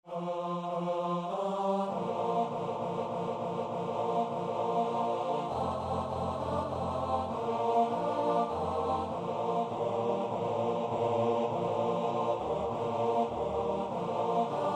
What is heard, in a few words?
barbershop quartet